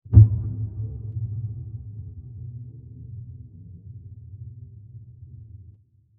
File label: Diffuse Low Impact; Low Impacts; Crafted Signals